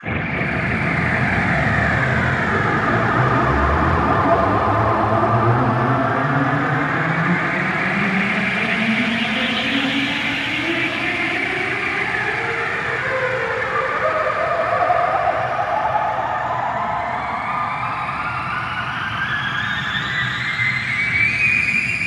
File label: Rises; neuro; Optiv & BTK